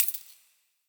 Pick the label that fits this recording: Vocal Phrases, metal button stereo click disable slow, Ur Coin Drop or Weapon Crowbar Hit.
Ur Coin Drop